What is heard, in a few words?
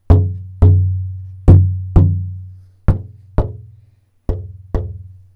taptone, guitare